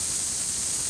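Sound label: steam vent hiss loop; Star Wars Obi Wan; XBOX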